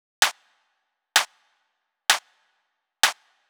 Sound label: Drum Loop 128BPM Clap; VEC5 Drumloops; VEC5 Multi Loops 128BPM; Loop Elements